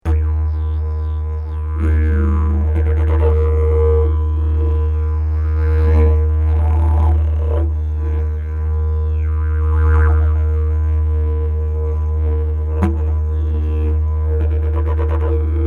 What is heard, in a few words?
didge